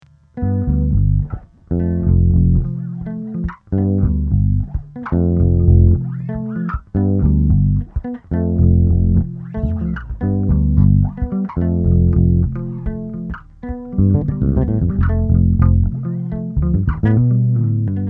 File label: Bass